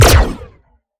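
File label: Sci weapon Shot Medium Laser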